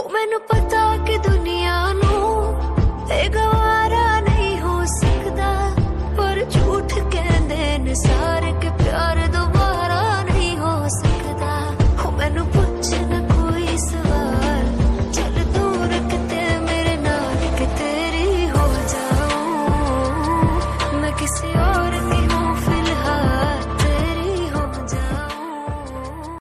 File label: female ringtone, bollywood